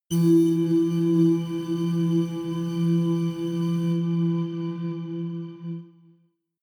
synth hum echo high, drones

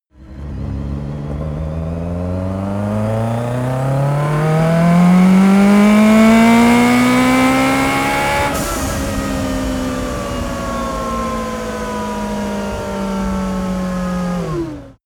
car lancia s4 acceleration high rpm deceleration

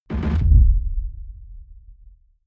heavy sub noise stutter; whooshes rises; hits impacts

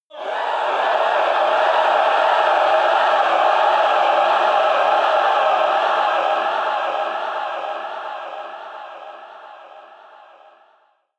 evil choir cluster high, horror

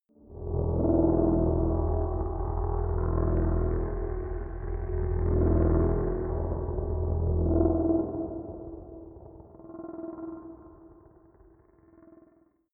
space dark ship; raumschiff

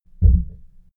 heart beat single flow

